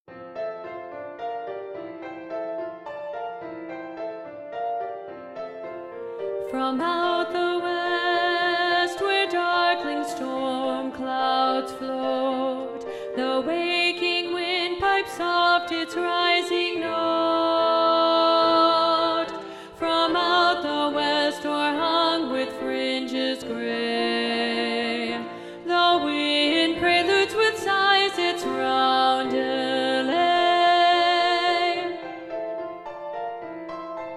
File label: SSA Alto Predominant